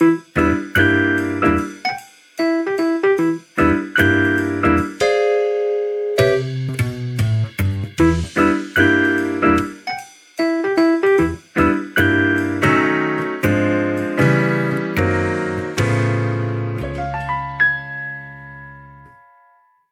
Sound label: Jazz life, ringtones; OnePlus